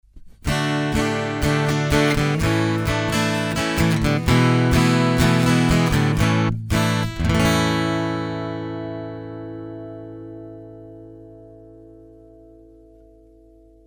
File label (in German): Gitarre